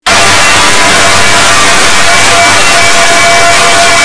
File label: loudest sound; pranks